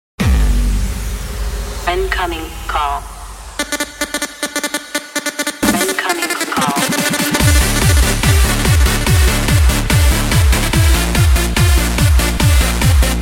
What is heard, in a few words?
Techno Incoming Call